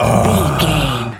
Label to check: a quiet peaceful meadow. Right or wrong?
Wrong